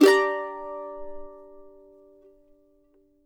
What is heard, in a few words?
mando min; Sampleheads; Whole Lotta Country 1 & 2